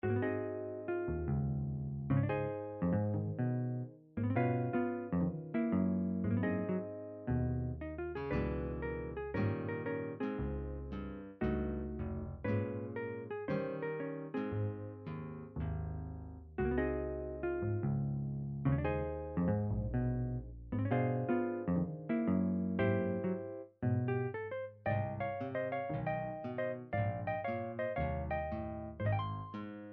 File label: guitar